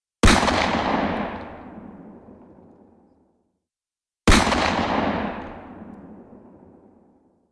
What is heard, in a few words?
gunfire long distance